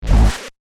Glitch Short Circuit, Glitch, Short, Circuit, Beep; Glitch Sound Effects